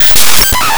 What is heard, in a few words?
Rhythm